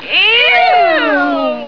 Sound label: eeuuu